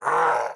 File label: Prisoner Prepare